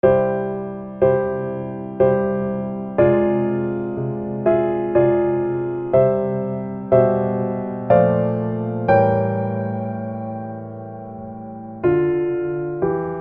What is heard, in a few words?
furusato piano